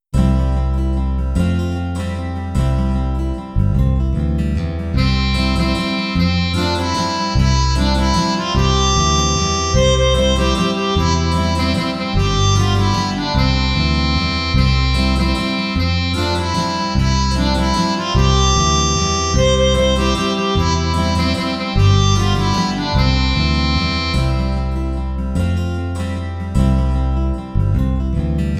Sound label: Row Row Row Your Boat, Ukulele G–C–E–A Tuning; Row, row, row your boat gently down the stream. Merrily, merriliy